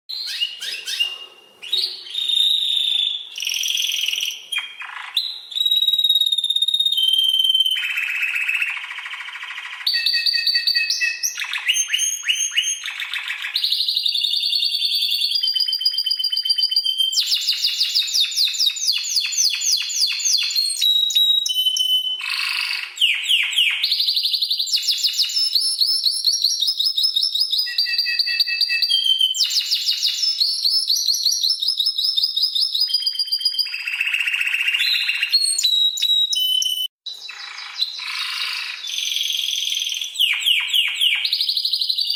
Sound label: Canary, Bird Songs